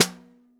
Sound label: Snare Bottom